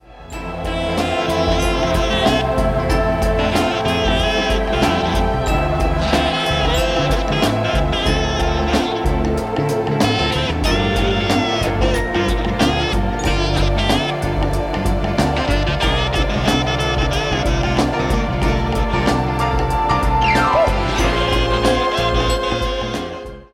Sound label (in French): Accords